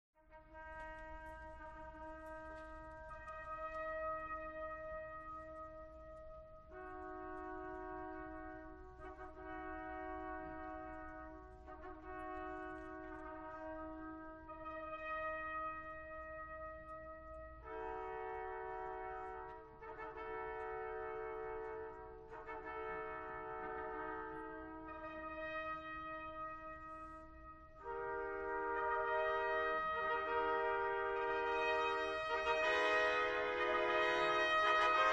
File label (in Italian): tuba